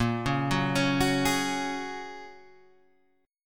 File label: Bb Minor 7th Sharp 5th, Guitar, Half Step Down